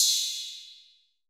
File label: cymbal, Roland MC-909